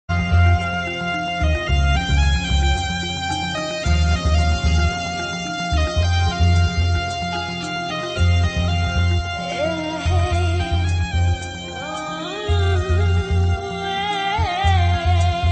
pastoral ringtone

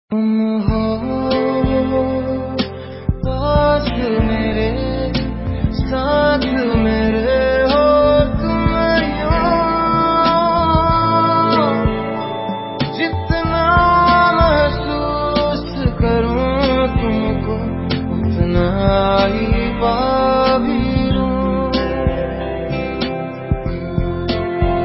hindi ringtone, bollywood

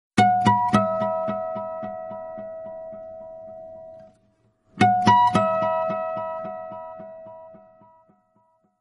short mobile phone message tone

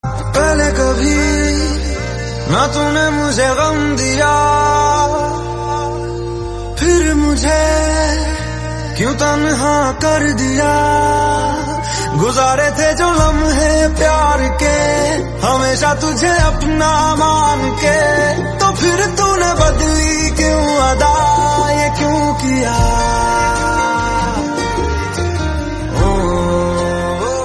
sad tone ringtone, bollywood

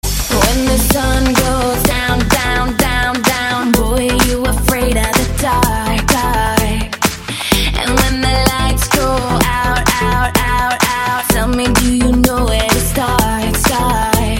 rock song tone ringtone, english

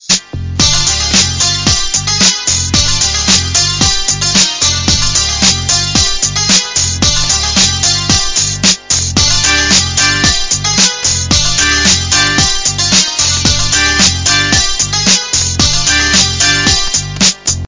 music ringtone; sholay

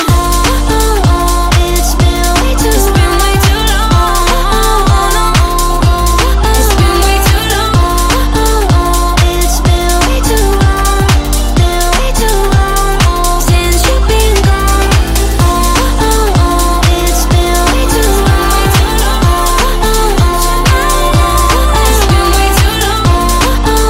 english ringtone

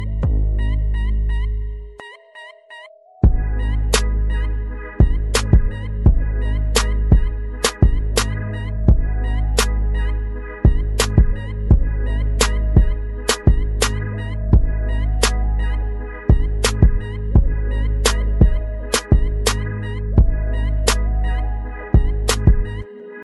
viral ringtone, english ringtones

